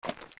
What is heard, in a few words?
steps; Jabu Jabu; zelda; OOT